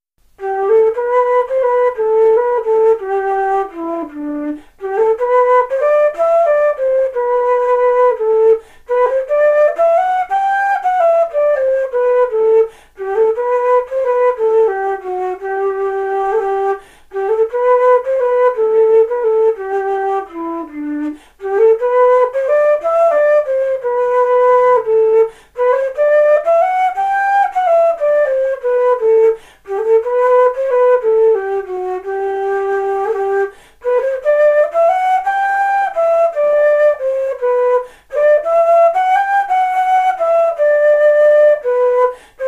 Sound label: Waltz